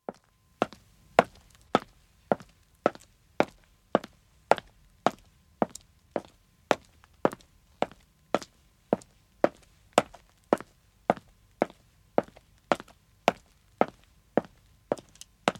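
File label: Footstep pavement walk, 1 man starts and stops; BBC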